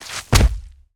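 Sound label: Footsteps Orient Turn Left Mud Dry; BIGMOUTH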